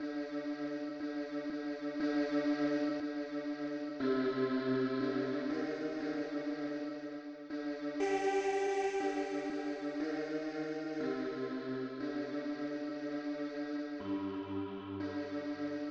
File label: bass; enosh kekhatsir yamav